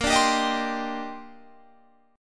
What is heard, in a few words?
D sharp Major 13th, A sharp, guitar chord